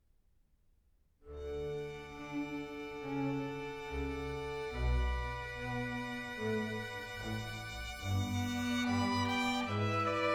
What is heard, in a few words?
Air On A G Reed